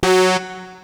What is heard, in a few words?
Beep